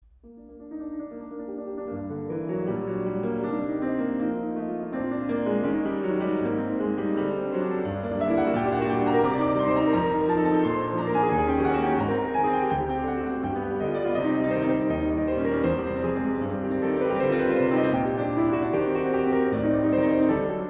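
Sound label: piano sonata g minor; stenhammar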